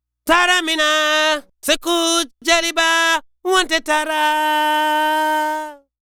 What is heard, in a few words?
African Harmony Vocal; Continental Drift Aftershocks; Vocals